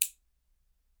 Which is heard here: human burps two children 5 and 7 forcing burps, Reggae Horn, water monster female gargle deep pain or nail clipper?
nail clipper